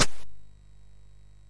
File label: SFX, MOS6581; Narco Police